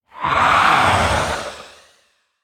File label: Attack